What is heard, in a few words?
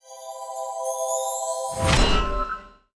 Cube Composition Fail, UI